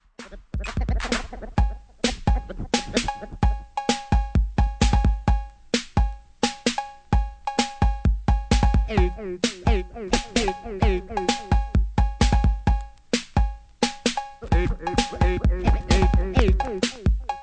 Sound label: techno beats